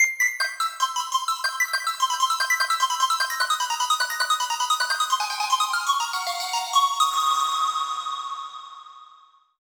Inst Harp

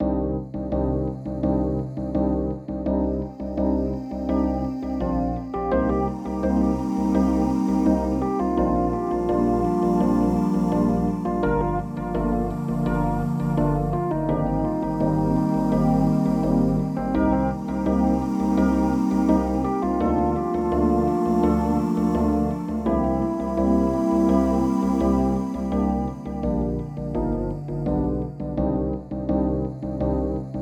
Skeletal Sustain (Act Transition); skeleton boomerang vampire hunter ost 2021

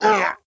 Slaver Recoil

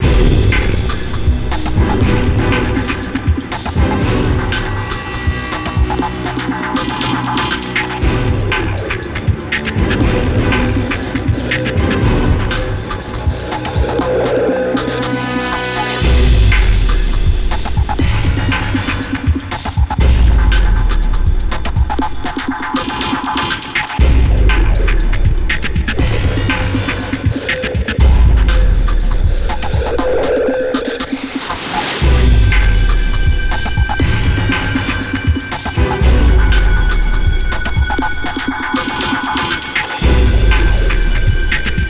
Alarm; Second Sight; gamecube

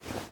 Cloth Crouch Up

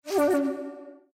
Calligraphy Battery Low, windows 7

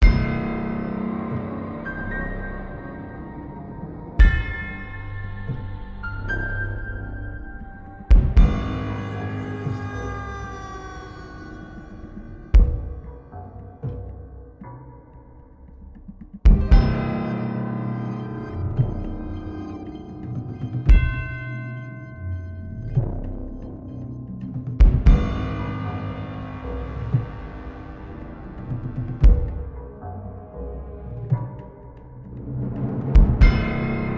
Library Suspense; Hello Neighbor 2

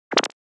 sandfish swim

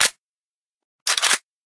Machinegun Reload

